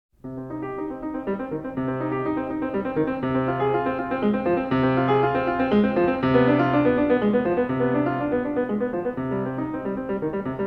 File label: Little Prelude In C Minor; Magrath Book 06